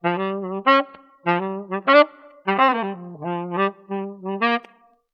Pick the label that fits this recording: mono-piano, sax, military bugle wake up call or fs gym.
sax